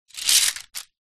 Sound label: tent pole folded pick up from concrete ground; foley